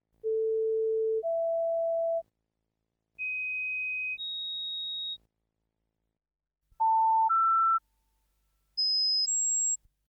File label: Physical Fifths; risset